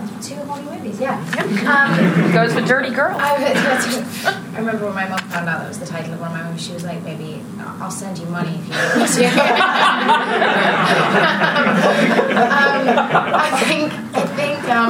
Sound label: Horn